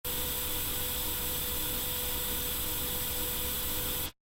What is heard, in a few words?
robotic arm long; soundscrate